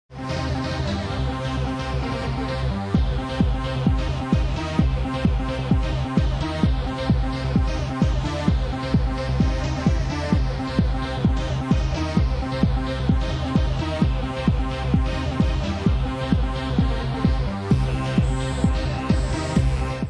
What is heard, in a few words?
delay energy rush; Suburban Delay